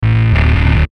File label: Descend Short Low Electrical Buzz; Descending Sound Effects; Blastwave